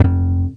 BS BS. GTR, Soul E. Bass; Roland - Rhythm Section

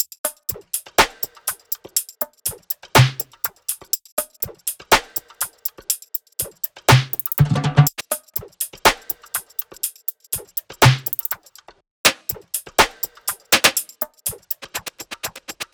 loop top 122, Seismic Loops

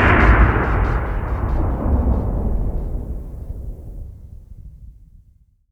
THUNDER; Best Service - XXL Production Effects

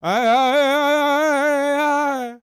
World Voices, CHOCTAW

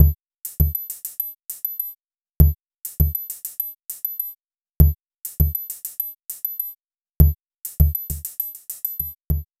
Cicada Kick Beat, Electronic Beats; Sonic Foundry (Sony Creative Software); Myths of Technology

